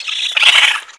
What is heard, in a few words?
die, houndeye